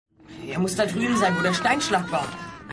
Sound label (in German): Schrei